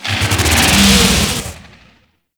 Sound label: Sentient Vessel Movement; Warframe; Tau